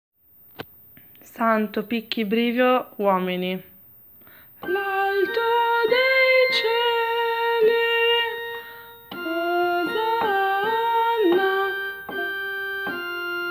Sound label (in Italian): Santo uomini coda